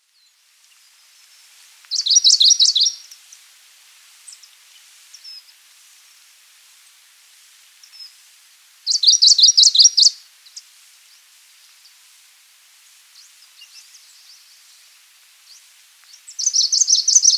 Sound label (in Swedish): lundsangare